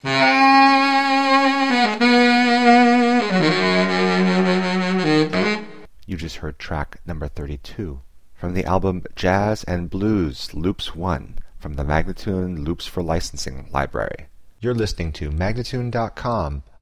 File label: spoken, Jazz and Blues Loops; Loops For Licensing; MagnaLoops